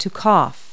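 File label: cough; Multi-Lingual Talking Picture Dictionary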